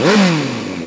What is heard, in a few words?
In Lift, mission audio; Grand Theft Auto Vice City; XBOX